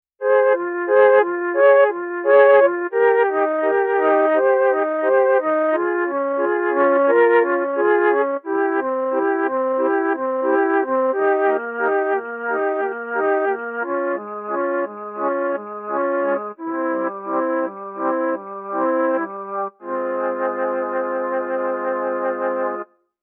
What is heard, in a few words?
tape flute